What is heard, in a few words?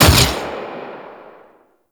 Fire Shot; Warframe; Weapons; Duviri; Incarnon Adapters; Incarnon Sybaris Prime